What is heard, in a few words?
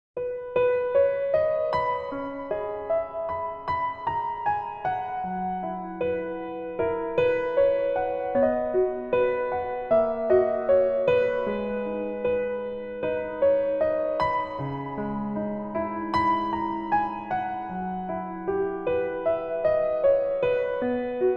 Piano ver